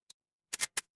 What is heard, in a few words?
office camera